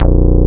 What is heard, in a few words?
MM BASS, Bass Guitar; AKAI S6000 CD-ROM - Volume 1